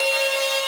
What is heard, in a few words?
synth main